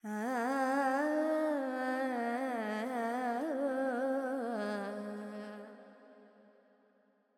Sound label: FX, Vocals, Carnatic Female, Alaps, Charukesi; Sample Banks